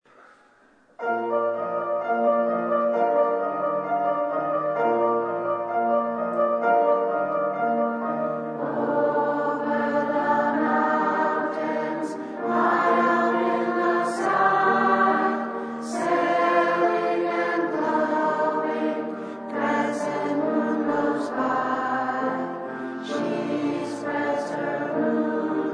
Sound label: Ukrainian Winter Holidays Song, choral